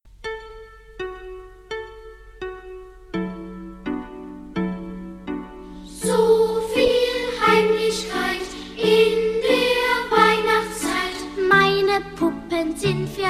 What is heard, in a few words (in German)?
So viel Heimlichkeit mit Gesang D-Dur